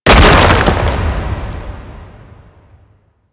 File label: Rock Stairs End C; Ambience; Orokin; Warframe; Orokin Moon